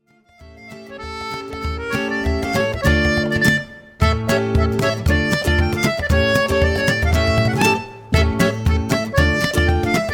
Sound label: Tantz Tantz Yiddelekh Jewish wedding dance